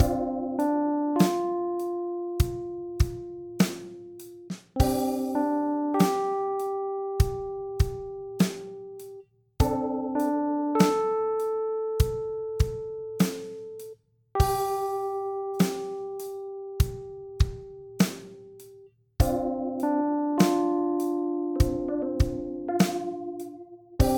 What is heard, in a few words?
Sad song